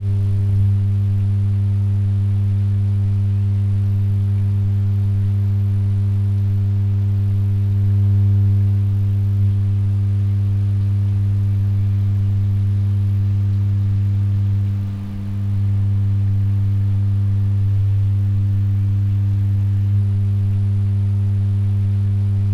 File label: Electrical Power Plant, Hum, Ext; Electromagnetic Design Vol 2